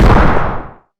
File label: Small explosion; Hovl Studio; SFX Battle sounds; WhiteMan Unity2D